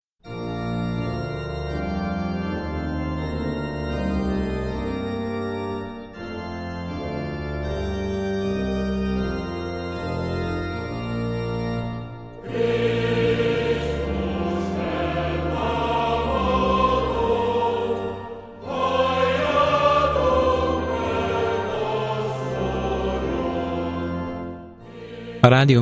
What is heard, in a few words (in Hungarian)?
Husveti Jingle; Husveti Szignalok